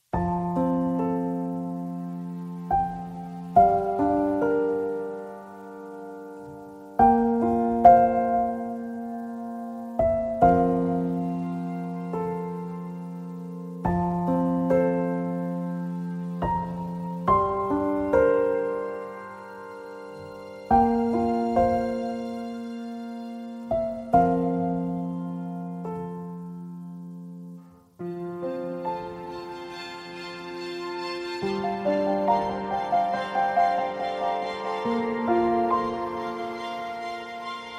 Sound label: Instrumental